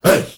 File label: HEY HEY